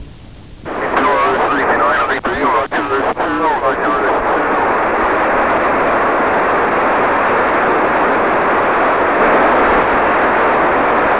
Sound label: meteor